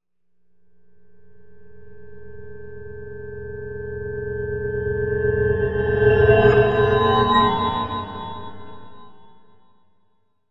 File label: Music Eerie Reverse, pads